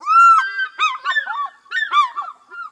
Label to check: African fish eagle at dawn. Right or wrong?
Right